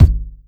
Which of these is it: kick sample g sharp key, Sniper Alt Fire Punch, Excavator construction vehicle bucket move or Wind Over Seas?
kick sample g sharp key